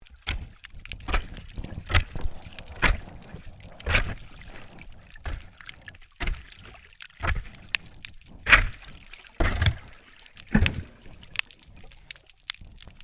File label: MAINTAINENCE WORK UNDERWATER SHOVEL SCRAPING